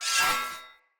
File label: Melee Whoosh Accent; Weapons; Swords; Dendrit Short Sword; Accents; Vetch